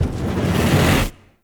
Operator Focus Blast Fireball Charge; Warframe; Operators; Powers; Attack

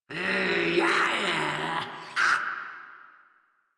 Laugh; Warframe; Grineer Catbrow Handler

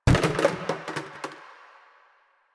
Plastic Hit

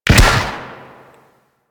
Grenade launcher Shot; Warframe; Weapons; Corpus

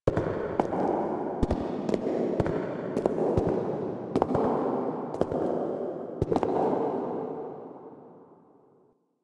Steps, Foley; Apostasy; Ballas and Lotus

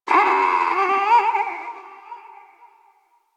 Forest Distant Medium Creature Small Call; Warframe; Grineer Forest

